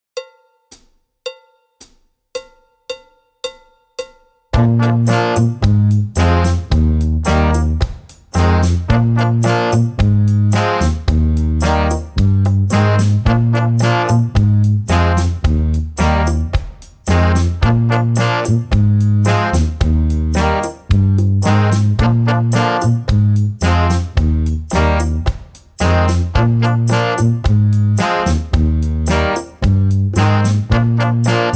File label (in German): Begleitung